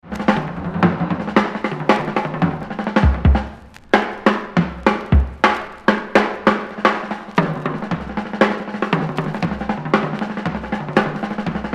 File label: Drum dream